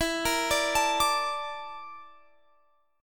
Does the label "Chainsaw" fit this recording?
No